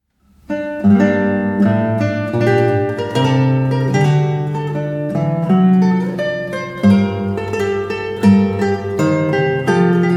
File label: Allemande andante; Weiss